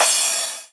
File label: Cymbals crash; Vengeance Samples